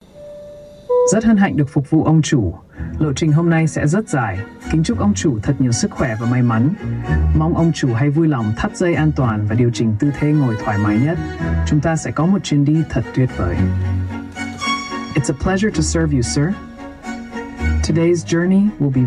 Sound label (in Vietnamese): nhac chao mung carplay style